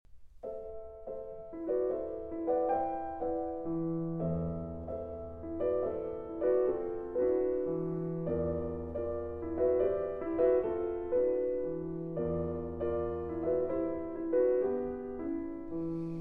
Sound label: Piano Trio in A Minor, Modéré